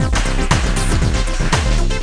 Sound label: Blue Drum; Cloudz 1.0.0; Games World; Hot New Games